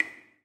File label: receive; ajaxim